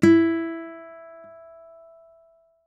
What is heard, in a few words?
dial tone guitar; Oppo Neo 5